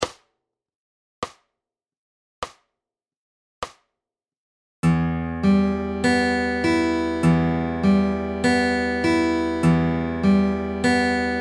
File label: Fingerstyle Fingerpicking Pattern E minor; Fingerstyle Fun